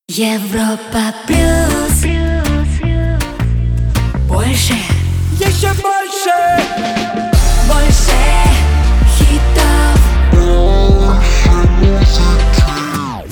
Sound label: Jingle; Europa Plus